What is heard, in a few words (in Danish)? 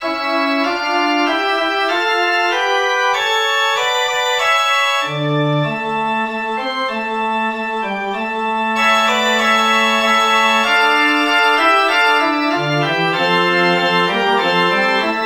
forspil vor herre til dig, organ